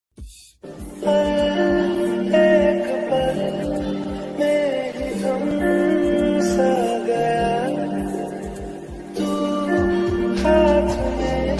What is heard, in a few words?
romantic ringtone hindi ringtone love bgm ringtone